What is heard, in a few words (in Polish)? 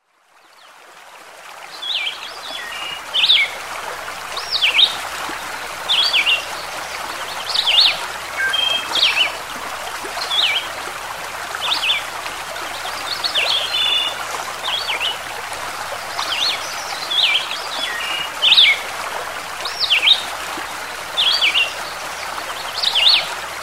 dźwięki przyrody